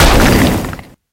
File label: hit doong